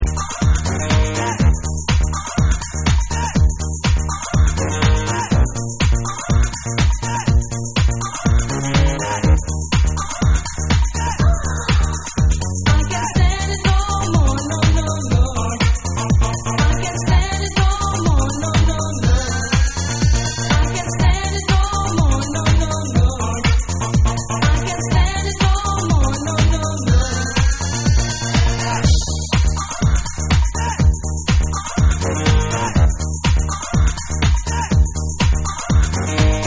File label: Long Instrumental